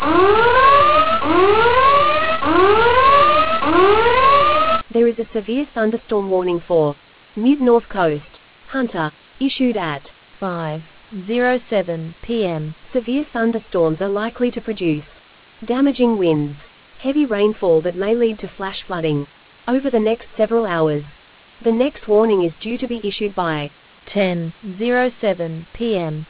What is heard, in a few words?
storm audio